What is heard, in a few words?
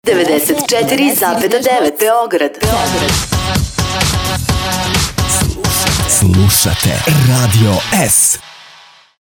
Jingle FM radio; 94.9 FM; Slusate